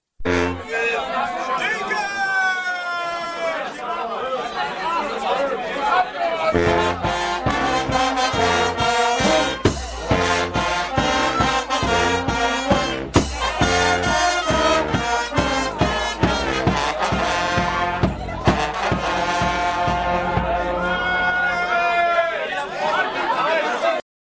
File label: Jingle, Fanfare